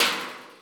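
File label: Trash Can, Drum Machines Alesis D4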